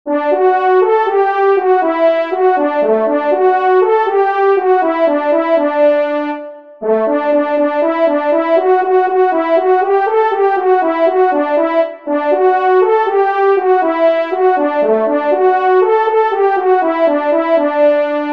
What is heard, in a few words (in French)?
Lancé Solo, trompes